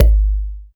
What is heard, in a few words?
Kick, Alesis DM5